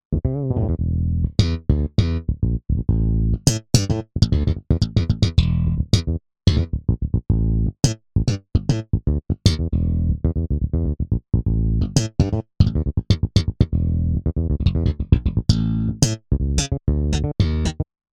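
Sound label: Bass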